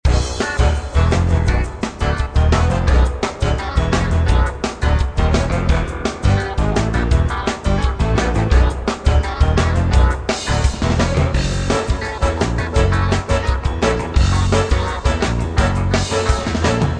highscore music; crazy chicken

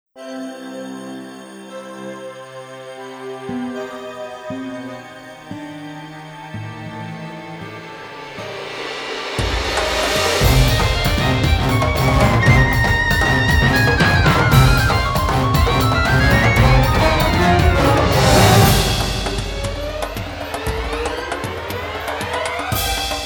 Lullaby; Monster Hunter Hunting Music Collection II Roar Chapter

